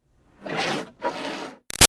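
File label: Water Spray Interior Car By Hood x2; Car Wash; Spraying; Objects; Big Room Sound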